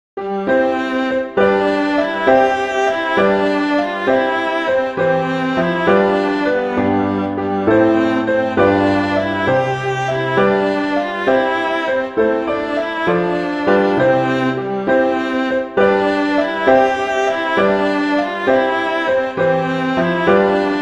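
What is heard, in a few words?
I Saw Three Ships, Christmas flute quartet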